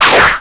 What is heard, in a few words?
knife miss; db mod 15